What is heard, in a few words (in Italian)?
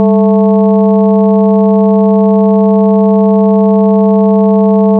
Ottava allargata